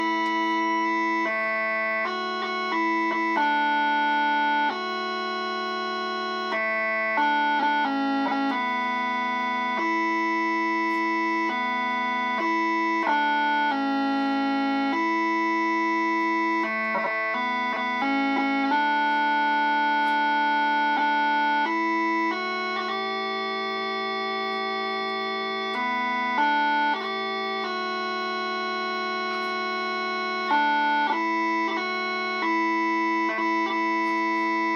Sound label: Bagpipes; Pokarare Ana; weddings